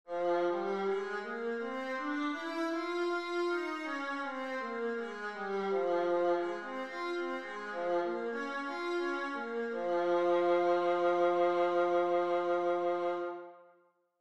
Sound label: viola f minor, scales